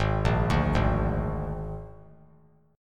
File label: Ab Suspended 2nd Flat 5th Ab, chord; arpeggiated; JGuitar